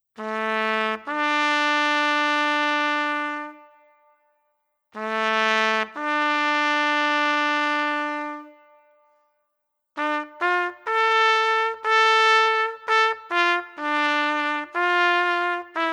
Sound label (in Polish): hejnal miasta hrubieszowa